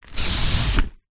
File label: cardboard box large slide; foley